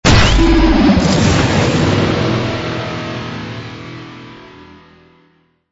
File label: ar2 hit